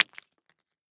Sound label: break brick; breakout style game